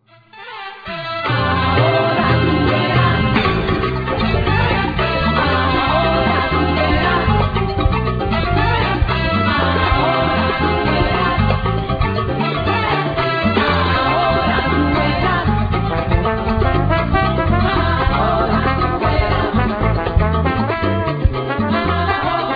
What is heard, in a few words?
salsa